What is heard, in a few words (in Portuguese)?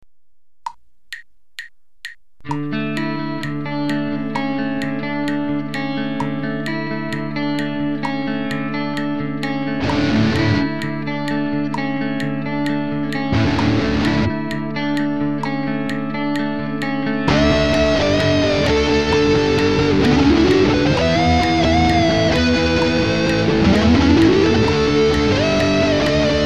solo